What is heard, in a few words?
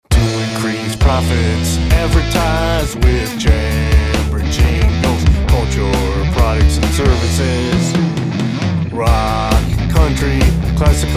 Rock Sample